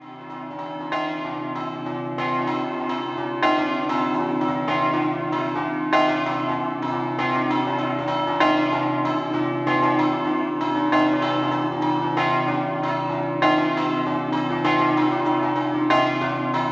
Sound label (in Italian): Villalta Scampanottata